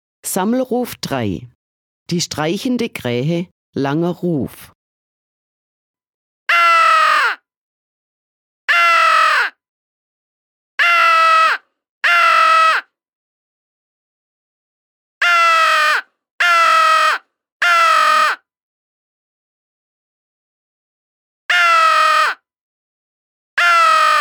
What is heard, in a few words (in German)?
Sammelruf 3 - Die streichende Kraehe langer Ruf